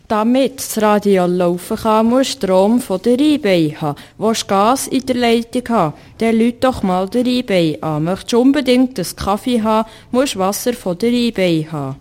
Werbung Jingle; Industrielle Betriebe Interlaken; Unterseen

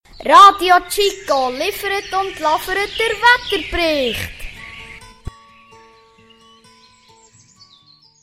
Wetter Jingle Anfang; Oberhofen

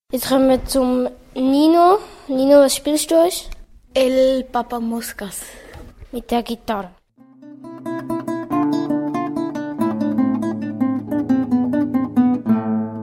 spielt Gitarre

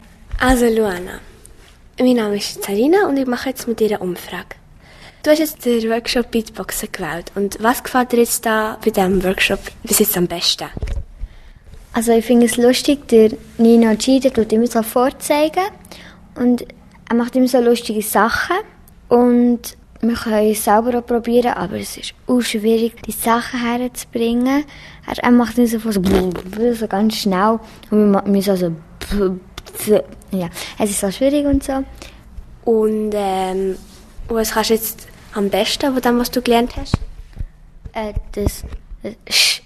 Umfrage Beatboxen; CoolTour 2013

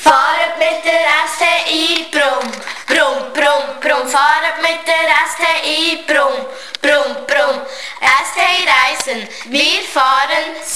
Werbejingle; Verkehrsbetriebe STI; Unterseen

